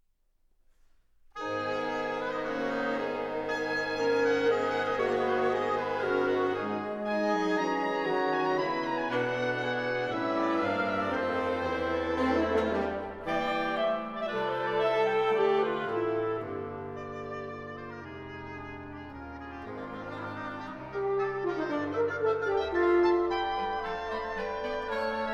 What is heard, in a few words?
Wind Orchestra; Grainger Edition Vol 4; Hill Song No. 2